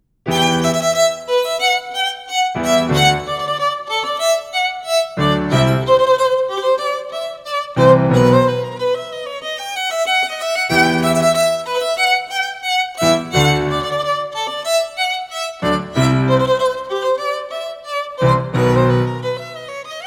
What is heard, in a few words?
Violin